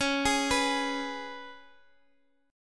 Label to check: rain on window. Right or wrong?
Wrong